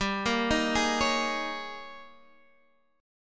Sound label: G sharp Major 7th Suspended 2nd Suspended 4th